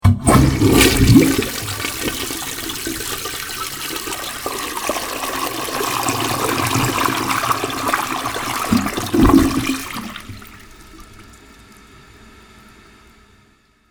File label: Toilet Flush